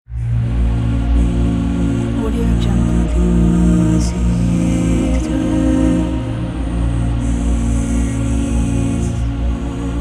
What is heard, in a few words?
mystical choir